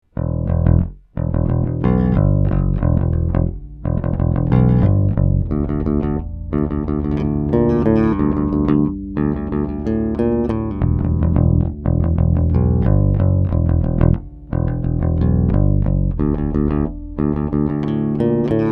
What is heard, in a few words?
Hornet Bass